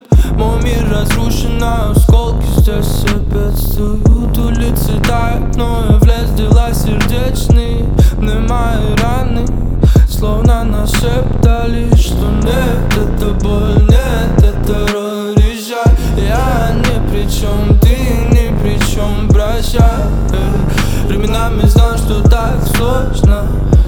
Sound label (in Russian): clomannoe pianino